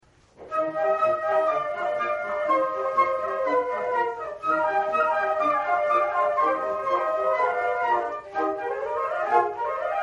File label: concert, flute; Newyear concert 2015